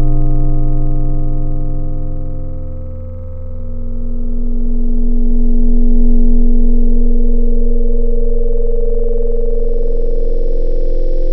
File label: Metallic Bell; Wavetables; Synthetic's Serum Collection; ESW Core Wavetables; Echo Sound Works Core Tables